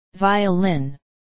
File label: violin